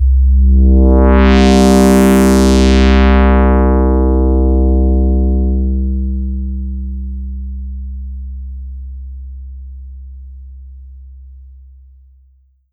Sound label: Yamaha TX81Z Analog Synth C2